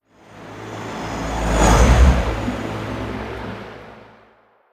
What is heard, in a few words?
event ship thrusters; Stellaris